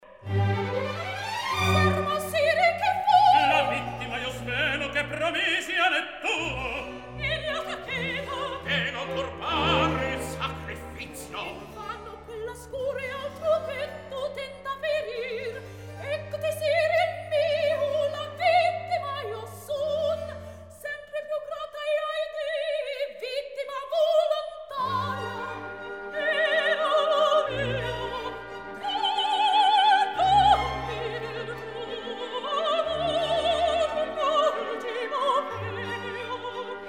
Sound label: Recitativo; Mozart; Idomeneo; Ferma oho sire che fai